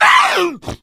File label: hunter pounce miss, voice; player; source engine; left 4 dead 2